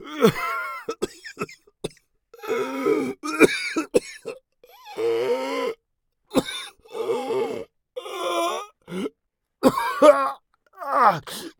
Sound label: agony; Escape from Tarkov